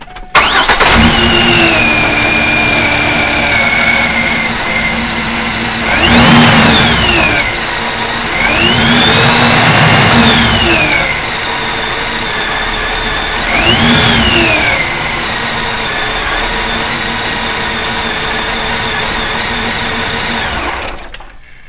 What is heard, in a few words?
Blower Whine, Supercharger